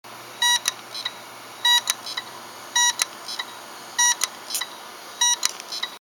hitachi crying, hd sounds; desktop; mac disasters

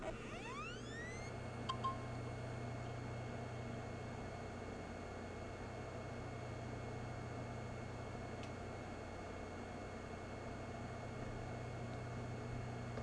hd sounds, desktop, ok or unclear; mac disasters

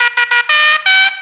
la kukaracha; unreal tournament 2004; fav UT sounds

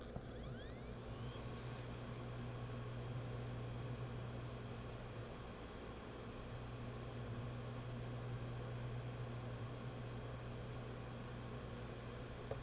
hd sounds, laptop, ok or unclear; mac disasters

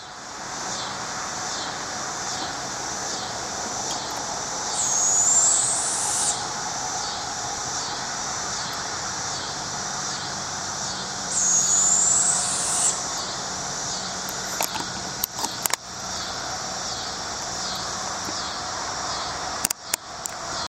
owls, bicycle trail; wildlife; birds

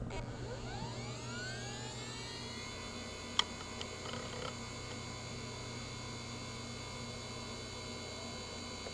hd sounds, desktop, ok or unclear; mac disasters

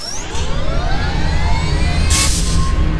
raptor launch; unreal tournament 2004; fav UT sounds

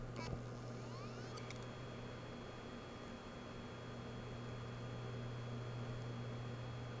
hd sounds, laptop, ok or unclear; mac disasters

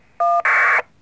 dig quieter, digipeter on simplex; radio; foxhunting; stuck transmitters

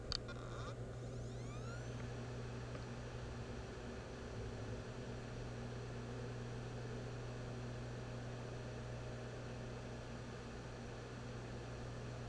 hd sounds, laptop, ok or unclear; mac disasters

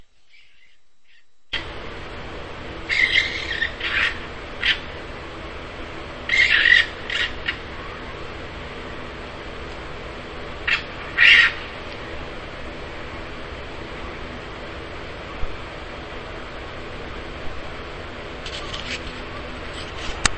midnight fight at feeder, raccoons; wildlife; varmints

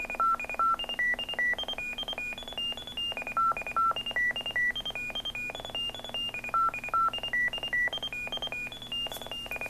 Fox; radio; foxhunting; Fox Box frequencies and behaviors

